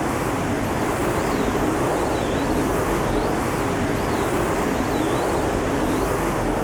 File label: tornado; Tormentaisland